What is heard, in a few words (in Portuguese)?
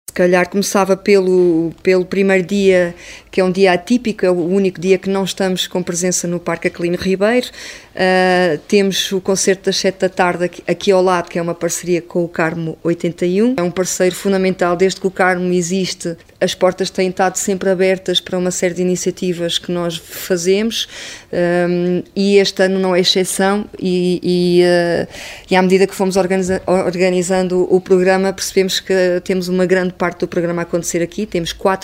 festival jazz; viseu; que jazz e este